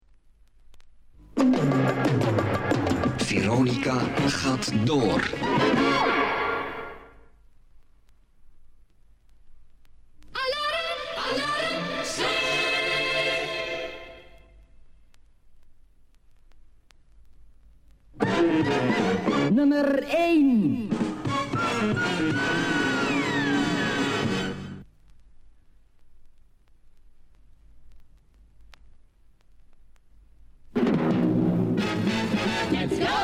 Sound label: Radio Jingles; Radio Veronica Jingles